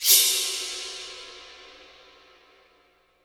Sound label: IMP CYMBAL, Cinematic Impact